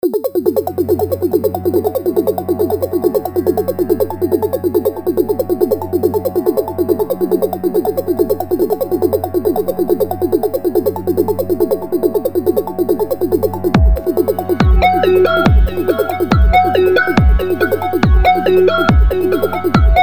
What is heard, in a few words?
Vocoder Bass Intro